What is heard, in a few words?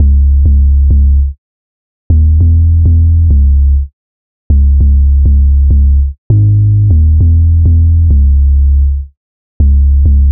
808 loop 100 C#m; Slate Digital BASSHOLE Sample Pack; 808 Loops